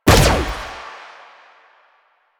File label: Fire Shot; Duviri; Incarnon Adapters; Incarnon Sicarus Prime; Weapons